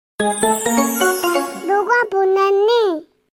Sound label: Very Cute Sms Tone